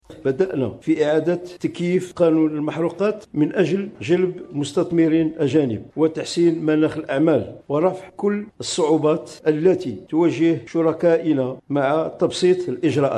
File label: guit